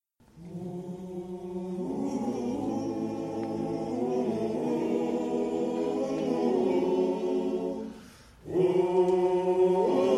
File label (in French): hegoak chant basque